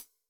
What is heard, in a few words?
Wet Hat, Alesis SR16